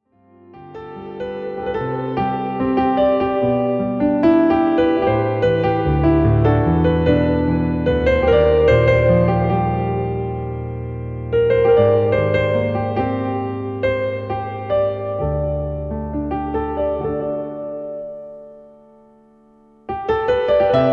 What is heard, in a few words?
piano